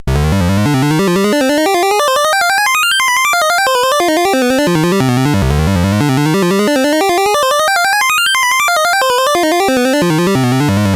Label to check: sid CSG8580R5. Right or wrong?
Right